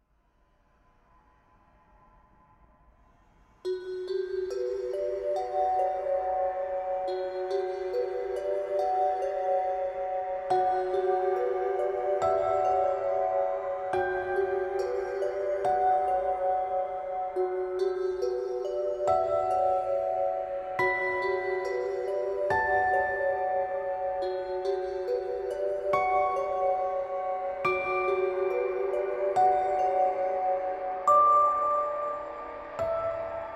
a sad quarrel

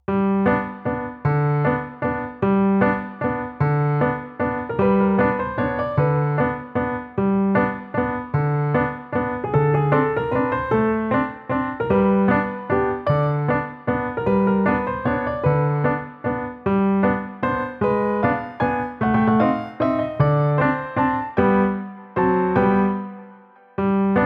Waltz

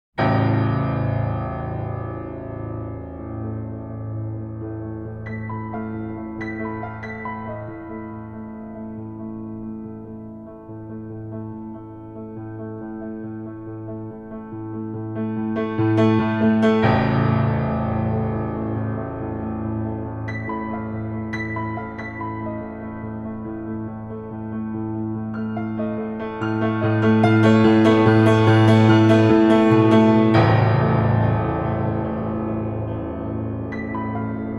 Piano

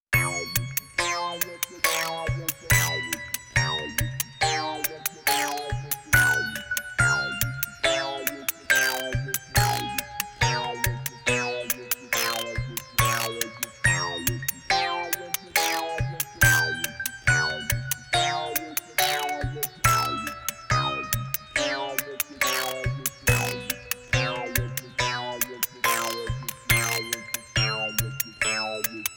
Witty Atmosphere

